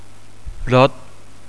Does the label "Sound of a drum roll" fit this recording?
No